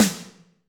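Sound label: Snr Bigger Box, snare; Alesis D4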